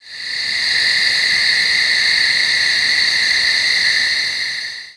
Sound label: crickets